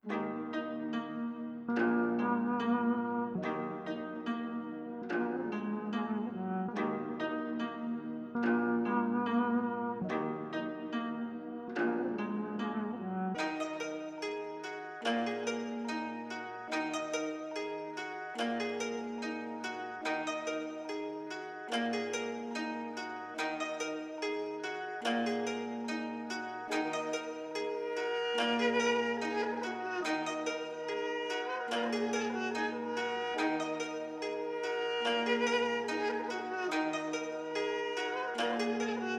D# 144Bpm

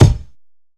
Warehouse Kick

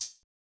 Paper Hi Hat

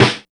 SNR

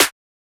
Clap

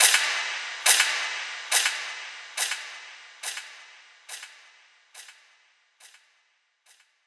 shots (fx)

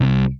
Bass, 808s

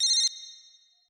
PHONE

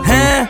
Haa, Vox; Paris

